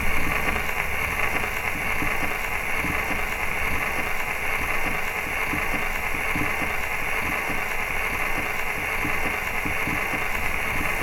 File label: Gramphone Turning